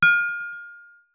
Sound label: cup clink